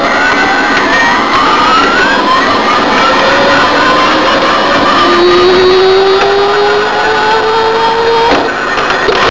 Zip Speed; 17 Bit Software 4: Phase Four

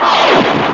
attack

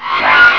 GUN; Death from Above

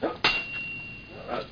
espada

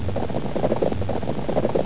Stampede, Polo; Equivision: Polo Thrills

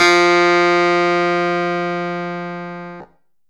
Clavinet F4, Vintage Keys; Future Music

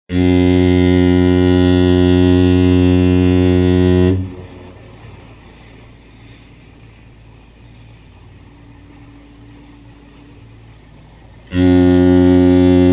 foghorn; WMBonusXP

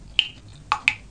DRIP

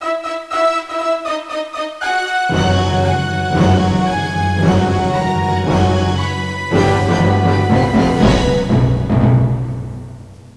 Jingle-Bell StartUp; Holiday Sounds; Seasonal

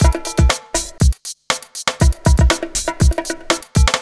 Latin Vibe(120), Beats

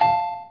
buycard

